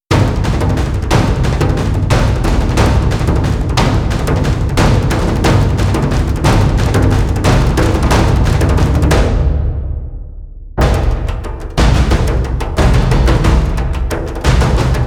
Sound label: Dragon Attack, Pounding Percussion Taikos; Pounding Percussion vol. 3